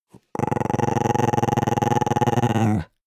dog small growl stutter; natur-tiere; hunde

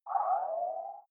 game start dull

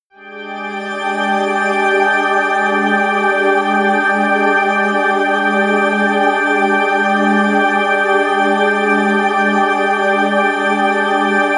strange place, music elements

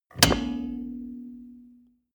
car bmw e46 interior seat lock in ring

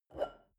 shell upside down grab from table soft; berufe; gastronomie; soundpool

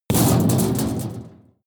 metal impact sheet bright rattle medium; hits explosionen; metall

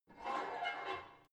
barbell heavy plate pull out quick, fitnessstudio

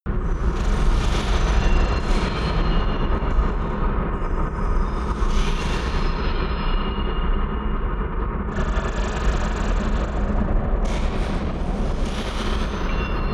purgatory chaos, horror

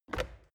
plastic water boiler lid off quick; maschinen; wasserkocher; soundpool

